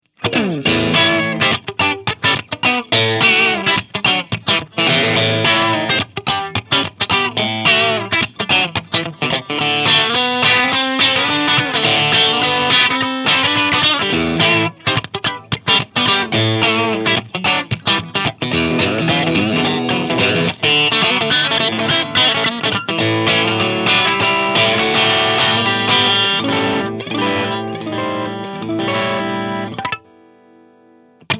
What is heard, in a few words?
Ibanez Iron Label Clean